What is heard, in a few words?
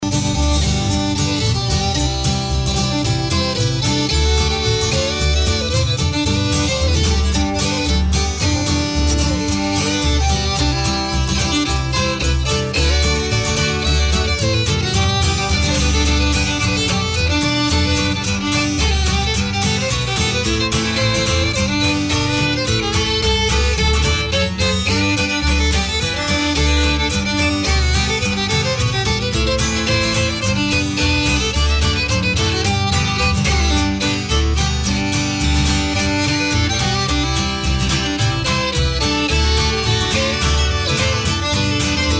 jingle bells